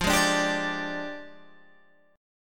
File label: F Minor 6th Add 9th, Guitar, Open G